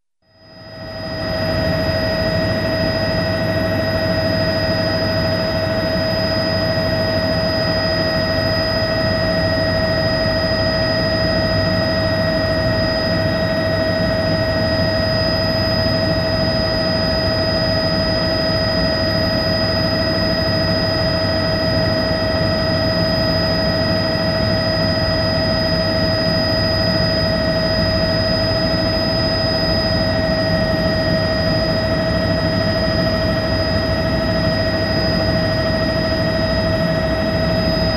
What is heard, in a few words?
Helicopter in flight inside